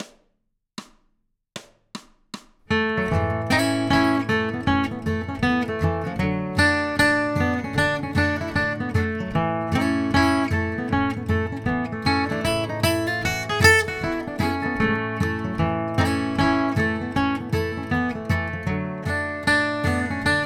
Bluegrass Guitar, standard fiddle tune with some more complex ideas G